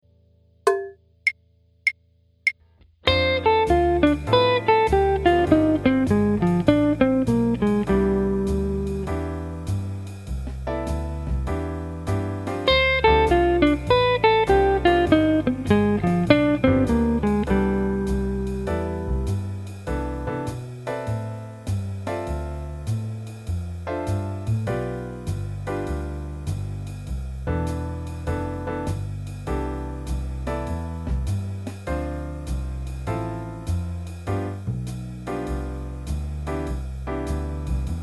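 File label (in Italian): Jazz Guitar modi, Scala maggiore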